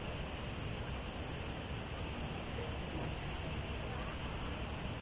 forge; smite; vulcan

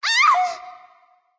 NV Ouch; smite; awilix

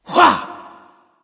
grunt attack; smite; ganesha

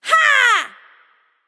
battle cry; smite

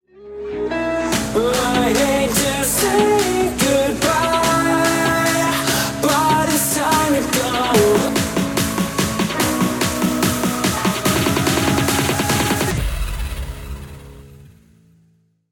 mus recall; Smite

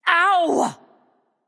NV Ouch; smite; awilix

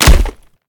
impact; smite; artemis v3 skin15

